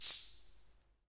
vox hh, Vox AC1 RhythmVOX; drum machines

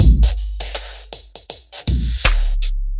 080 broken kick skin break

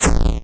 8bit kit impact; LO FI

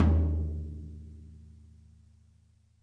real TOM, drum kit; REAL LIVE KITS; A Real Freakin Drum Kit

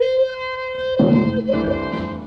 trumpet finale; melodic samples; horns sax trumpet brass

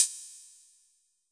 shimmer strike, hats; DRUMS (SINGLE HITS)

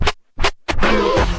turntable oh, Scratching and Turntablism

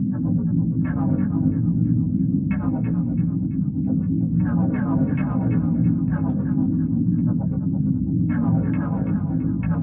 atmospheric creepy thick echoes; Atmospherics

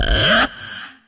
Electro FX; Ueb HE Electro FX

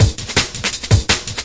165 squashed break; 161 to 180 bpm

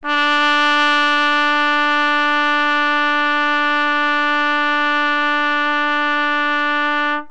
c trumpet d4; Horns and Brass

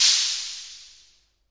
vox cym, Vox AC1 RhythmVOX; drum machines

